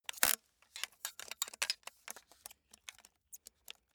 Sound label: ratchet light rattle